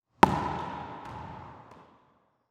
Sports Racquetball Ball Bounce

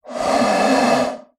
Animal Reptile Lizard Beaded Lizard Breath; animals; AdobeCC

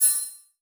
Multimedia Internet CD-Rom Flash Ding

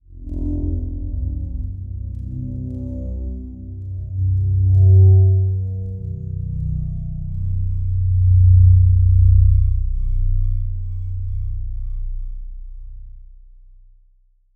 Production Element Title Transition Bed

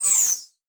Multimedia Internet CD-Rom Flash Pop Up Short

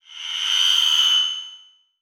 Buttons Mechanical; Adobe; Multimedia Internet CD-Rom Flash

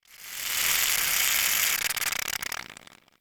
Shaving Cream Releasing Cream, Household

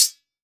Impact Sword Impacts

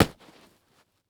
Sports Bag Sleeping Bag Folded Dropping On Cement

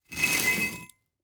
Foley Metal Drag Through Rock Salt Quick

